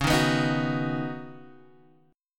Db 7th Suspended 2nd Suspended 4th, Guitar, Standard; JGuitar